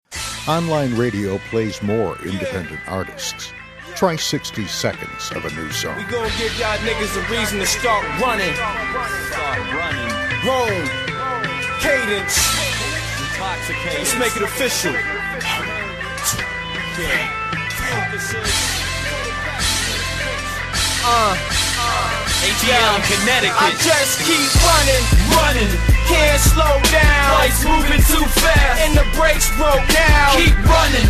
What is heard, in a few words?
rock